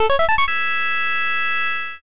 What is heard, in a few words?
levelup big